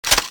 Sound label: clipout; csco; csgo; weapons; balrig3